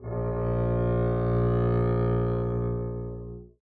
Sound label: arco bb1 f up, double bass